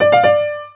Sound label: piano